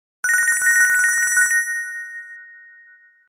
Nokia Tinkler

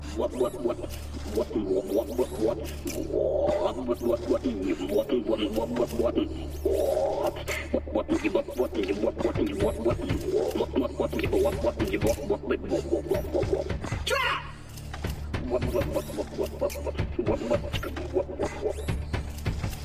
Dubstep

